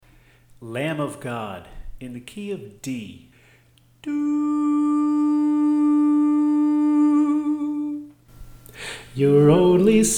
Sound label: Soprano